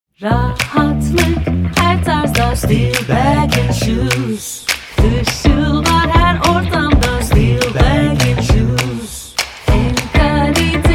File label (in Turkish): vokal jingle; stillbag